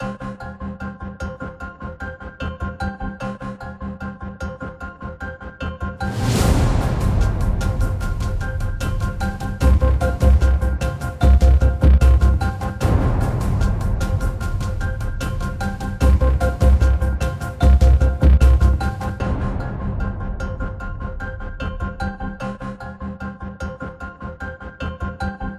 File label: Intro Cinematic Loop Stem